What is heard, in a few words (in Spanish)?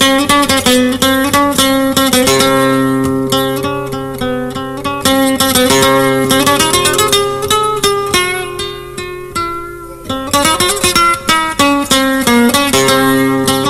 Siguiriya; del Fillo; cabal